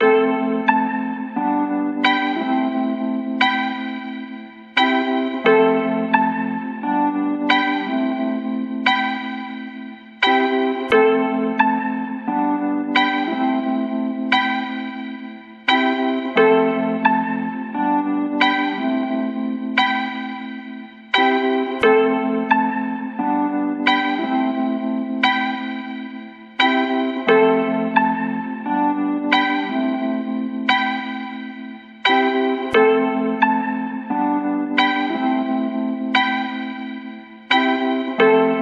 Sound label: loop wo drum